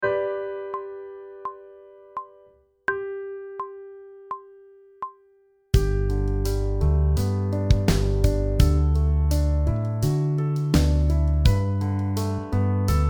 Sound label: Sight Singing backing track, pop vocals; Grade 1